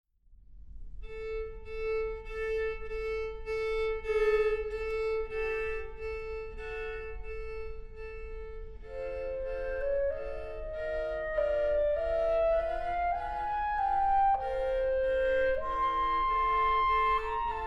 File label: Trio for Clarinet, Viola, and Piano - Preludio; Largo; Bruch Trio